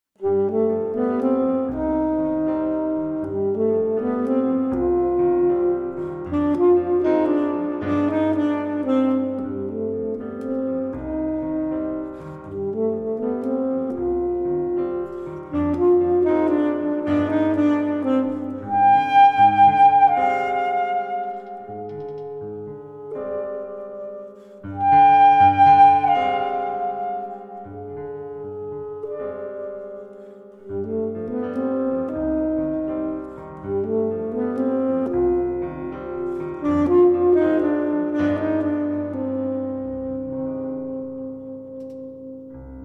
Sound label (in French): valse